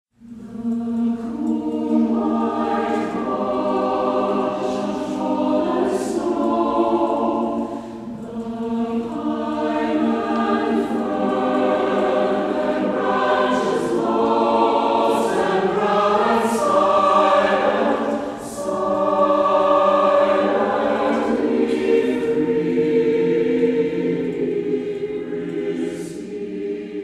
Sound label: Chorale; Wheatland